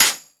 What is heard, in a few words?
FX Sounds, Drum Machines